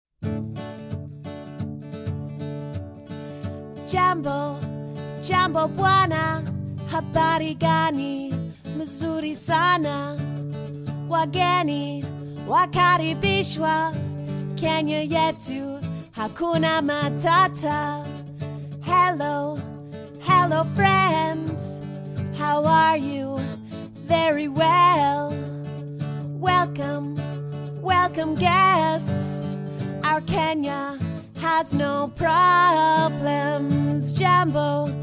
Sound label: kenya song